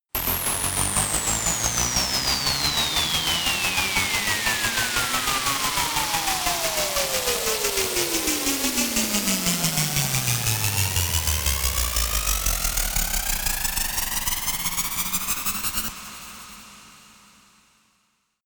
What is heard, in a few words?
crackle noise